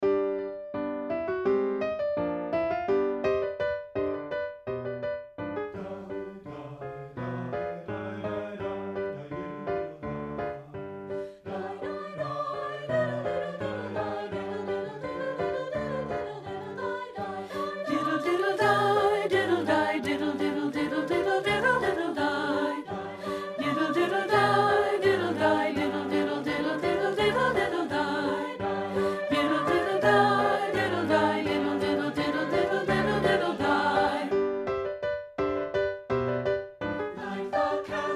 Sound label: ALTO; satb